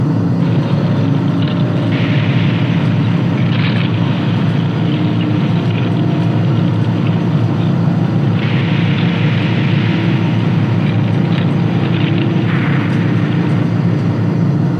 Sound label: cooling ambience